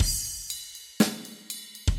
RITMO

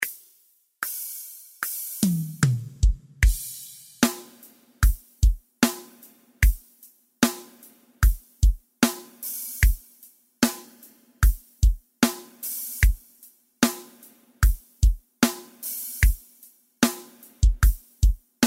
SERTANEJO BPM75

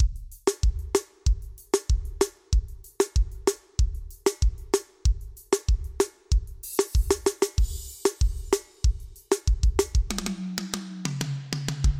Vanerão

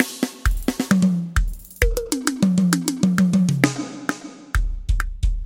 VIRADA

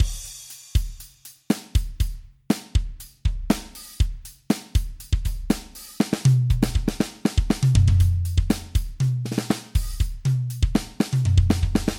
Sertanejo

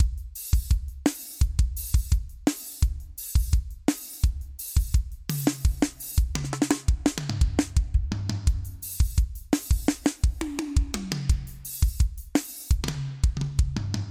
Forró Romântico

